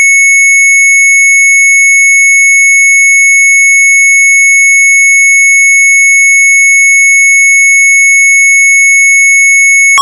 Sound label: MZ800 Tape; sharp; REIS NAAR AMERIK; retromelsarchive